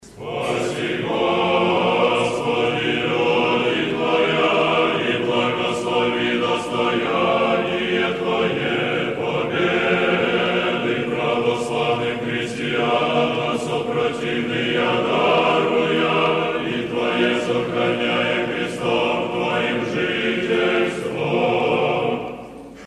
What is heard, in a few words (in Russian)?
Hor Tropar Krestu Vozdvizhenie; Moskovskogo Sretenskogo monastyrya; Chestnago i Zhivotvoryaschago